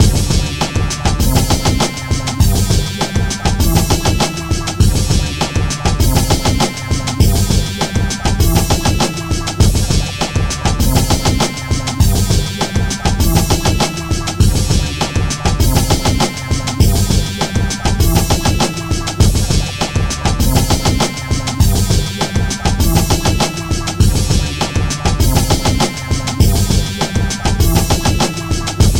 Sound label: Alien Vibes Hop